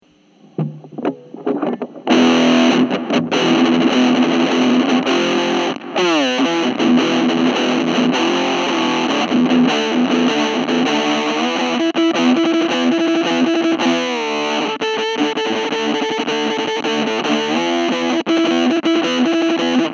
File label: fretless